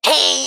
Rogue Prepare; Pecheneg

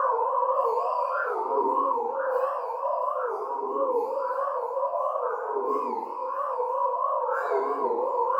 Shade Fly

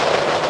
Winter games; Sfx Wintergames

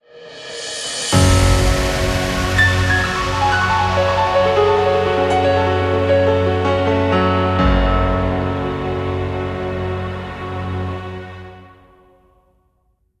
Victory Jingle 104 4-4; SunEaterPhase3VictoryPart2

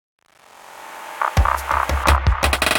Swamp Battle Intro

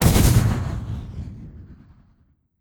Blazing Imp

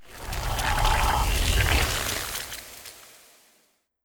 Enchant Absorption Spell

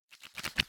Playing Card Place

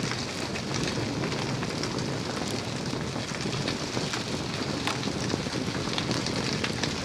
Campfire Loop

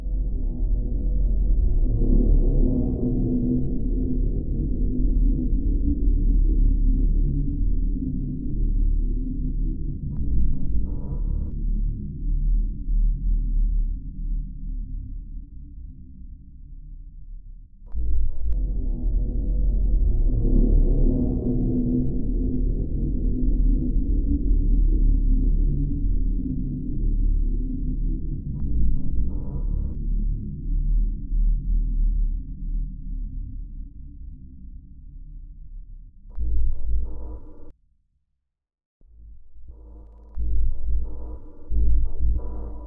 Prison ambient

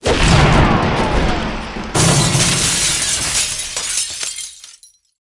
Sfx Basketball

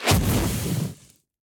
Sphere explosion; Likho

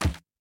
In Obstacle Pouch Broken

